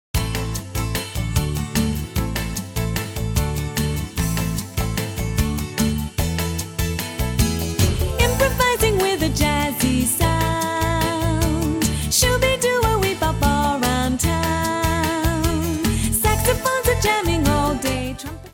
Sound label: Dream Song